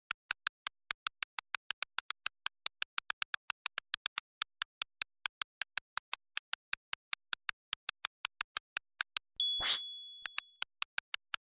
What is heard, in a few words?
typewriter; Little Computer People; gamesoundopedia